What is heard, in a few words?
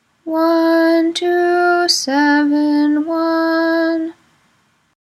weekday ahava rabba closing, nusach